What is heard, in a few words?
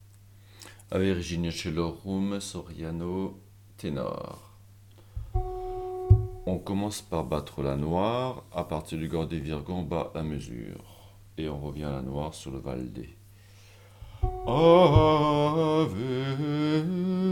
Tenor; Soriano; Ave Regina